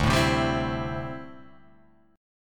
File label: D 7th Suspended 2nd Suspended 4th D